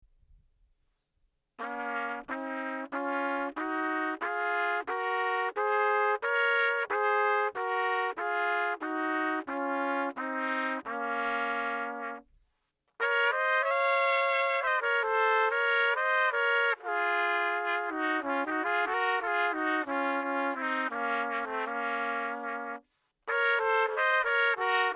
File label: Harmonizer C Minor, Saxophone; Pitch Manipulation Pedals